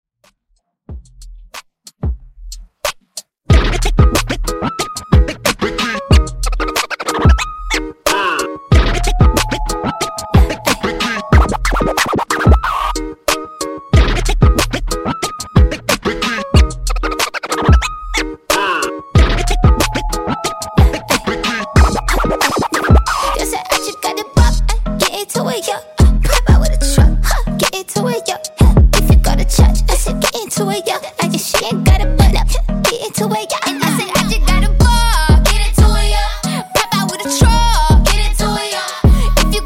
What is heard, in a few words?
Dj intro outro 92Bpm